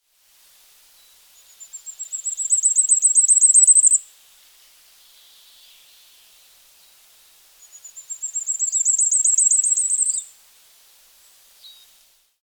roitelet triple bandeau

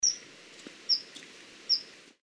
martin pecheur d europe

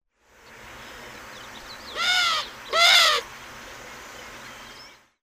tourterelle turque

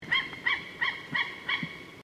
pygargue a queue blanche

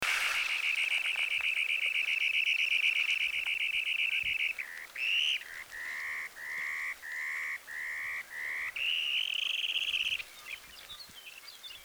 becasseau variable

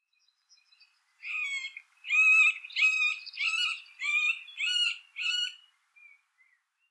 pic mar

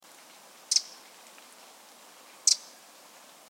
troglodyte mignon

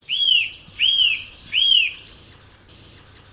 sittelle torchepot

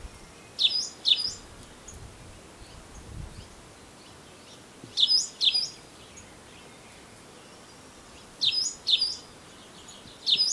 moineau espagnol; Cri : quasi-identique au chant

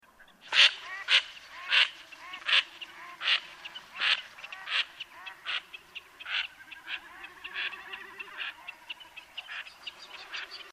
sarcelle d ete, orn

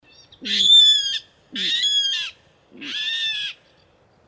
rale d eau

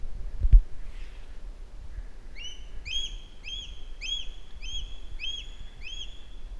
aigle botte

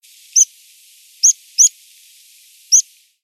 pipit spioncelle

